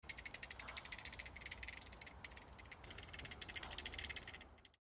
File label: bat clicks